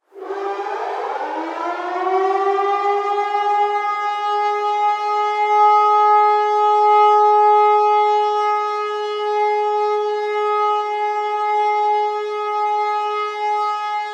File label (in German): alarm sirene